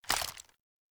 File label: Pistol Reload Mag Swap; Warframe; Tenno; Lasrian